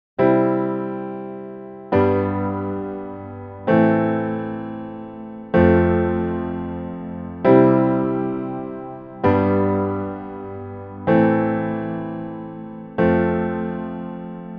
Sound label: 4 chord; popformeln